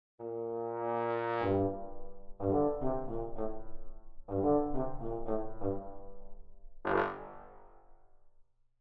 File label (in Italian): trombone